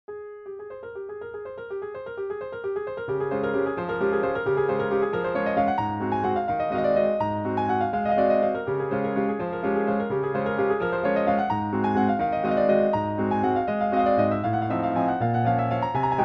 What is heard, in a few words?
Ivory II Bos 290 Bos Studio Audience Amb